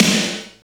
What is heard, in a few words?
SNR, Tekno Industrial; East West